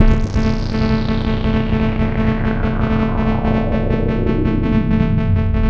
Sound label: Bass, Futurist Drum & Bass